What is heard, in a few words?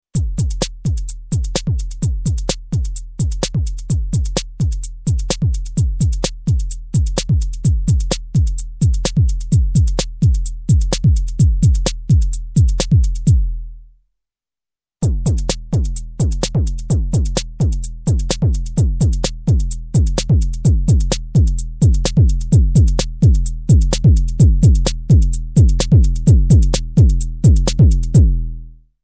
Kick; KickLancet; Balls